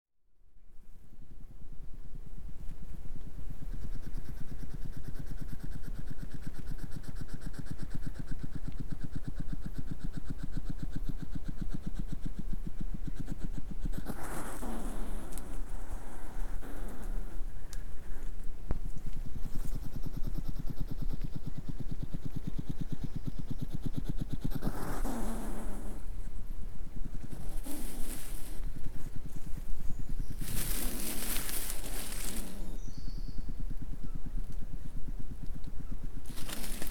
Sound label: hedgehog